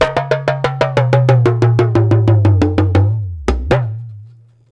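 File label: 120 bpm, Ju-Ju Talking Drum Solo; Spectrasonics; Supreme Beats; African Contemporary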